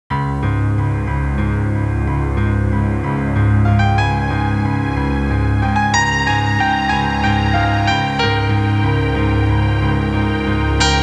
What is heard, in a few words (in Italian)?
musica newage